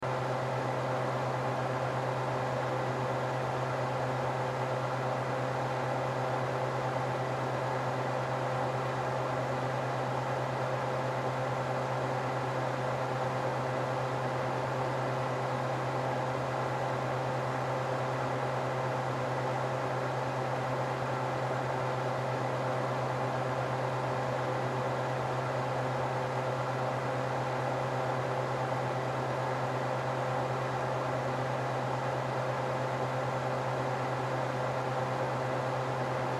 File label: Oven Fan High, Oven Fan High Loop; Appliance; Blastwave